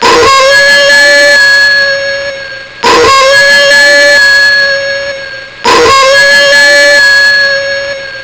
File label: wolf